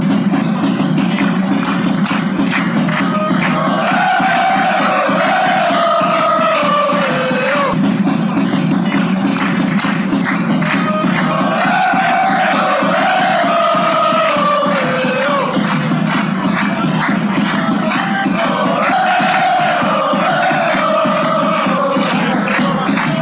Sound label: ole ole oh ole oh